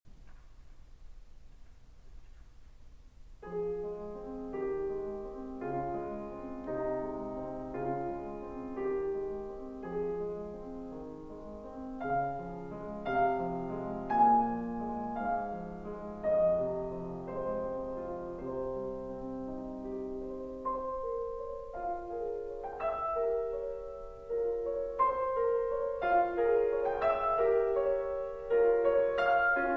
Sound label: Rainy Night sax